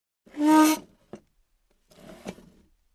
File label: Furniture Moving creaking on floor